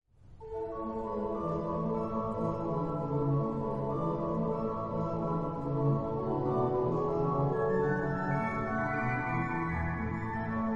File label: Toccata